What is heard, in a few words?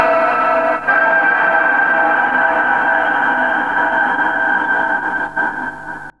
Atmos, Strings & Pads; Zero-G Sound Sense Grime Scene; 90 s Sample CDs